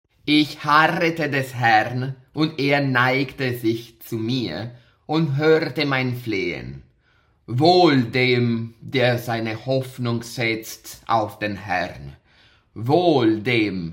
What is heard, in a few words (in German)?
Duett Soprano und Chor